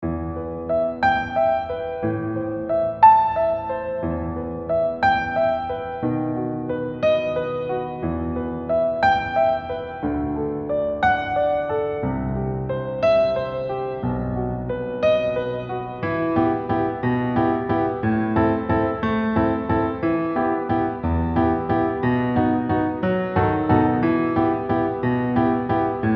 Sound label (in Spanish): para violin pista piano; la llorona